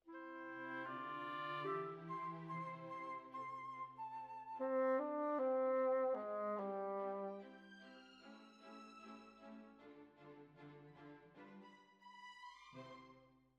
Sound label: Bassoon, Jazz for piano